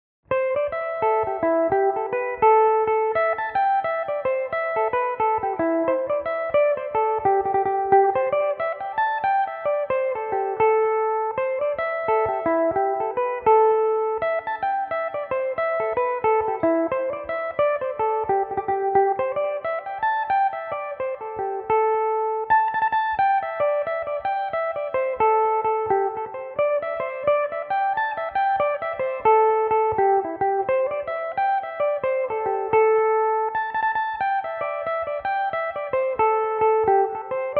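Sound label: A minor, irish mandolin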